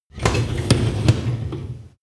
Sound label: dishwasher tray bottom close